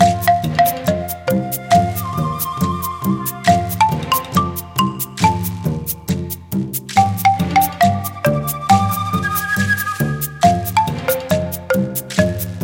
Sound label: Win; Cooking Dash